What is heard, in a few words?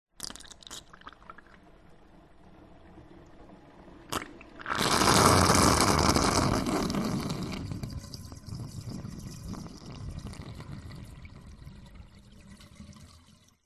household bathtub drain short single gurgle bubbly; zapsplat